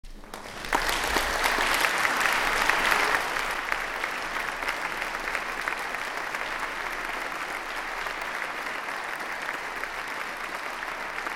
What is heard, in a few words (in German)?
Vororchester Applaus